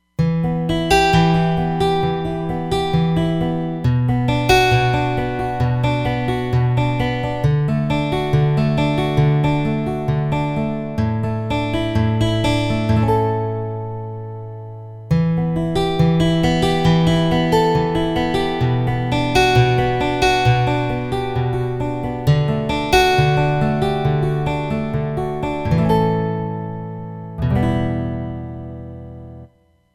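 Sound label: Steel Guitar, Roland Fantom XR; Comp Stl Gtr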